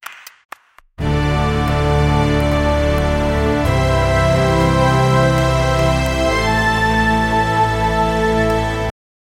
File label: victory